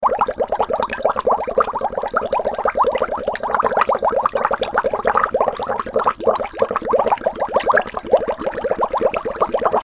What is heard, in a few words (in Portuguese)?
agua borbulhando